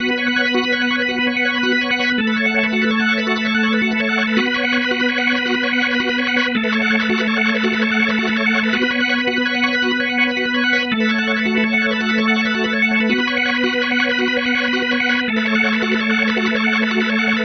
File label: Psychedelic, Organ, Loops